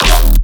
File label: bass hit smashed D#; Virtual Riot